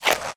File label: put back shield; Mount&Blade Warband